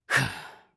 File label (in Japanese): ハァ